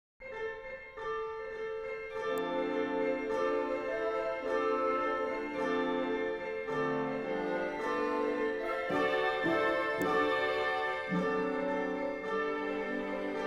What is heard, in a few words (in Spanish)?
Campanas de Pascua